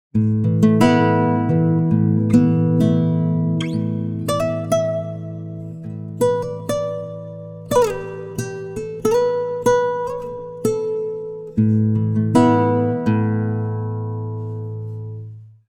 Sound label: guitar, timestretch; digidesign pro tools xform